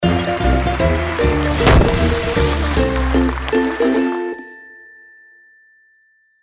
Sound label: outro; Lucha Rumble; Pinata